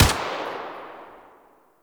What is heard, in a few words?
Shot, Shotgun; Warframe; Eidolon; Shotgun Lancer